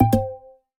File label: match notready; MINECRAFT KRUGI